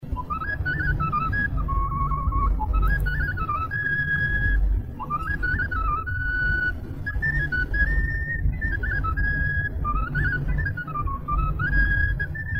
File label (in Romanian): Sarba la fluier; jocuri si cantece batranesti; Vaslui